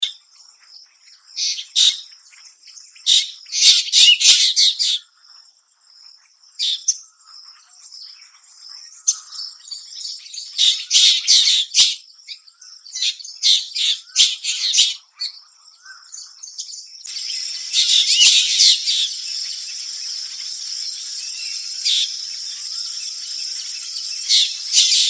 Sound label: Black Drongo